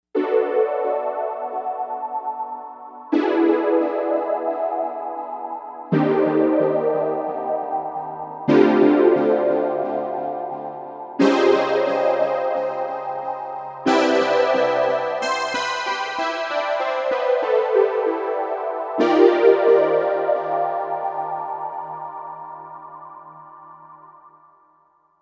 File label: Poly Pad, Novation UltraNova; Factory Patches